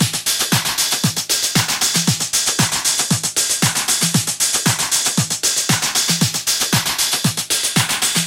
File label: dub techno loops loop